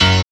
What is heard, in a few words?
SYN, dance; Sound & Vision - Gigapack II